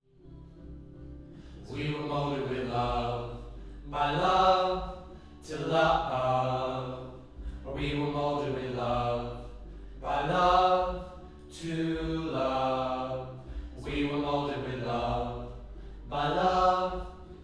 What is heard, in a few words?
Choir Harm Low